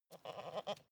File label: goat baa muffled male